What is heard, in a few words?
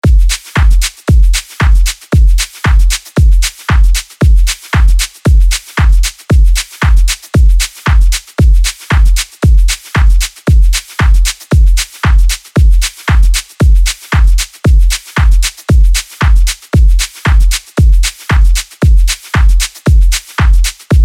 Whistling Bird